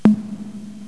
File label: Drum